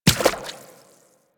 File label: Inf Medium Run Water, Infested Footsteps; Warframe